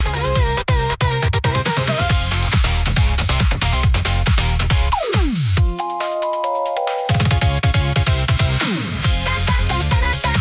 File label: loop